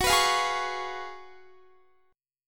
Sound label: Gb Major 7th Suspended 2nd Suspended 4th, Guitar